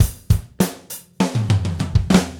Gretsch groove 90 BPM, 1967 Gretsch Kit drums

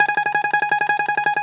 DOMRA

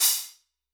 White Chiff, Hats; Airwindows

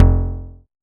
bass F1, Roland MKS-80

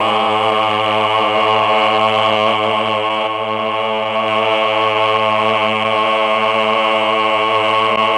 CHOR; ILIO; Propeller Island Legendary M400

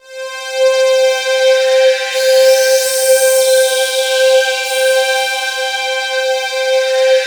CELESTIAL C5; Q Up Arts; Dream Experience

